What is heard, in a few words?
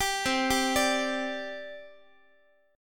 C Suspended 2nd, C, down, Guitar; Csus2 arpeggiated